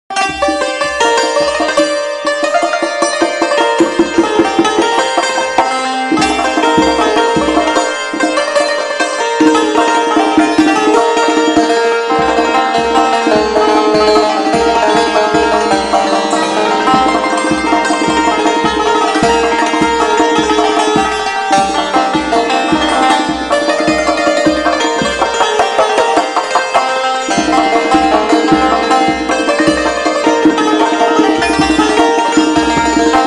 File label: Santur Tonbak, play back